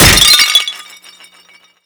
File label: Pottery SFX KO